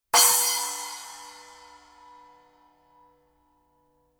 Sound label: splash edge; series 10